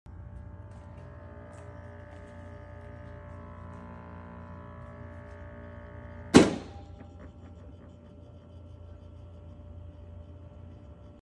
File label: Water cooler